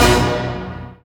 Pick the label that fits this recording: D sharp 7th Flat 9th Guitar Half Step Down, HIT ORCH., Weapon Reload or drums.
HIT ORCH.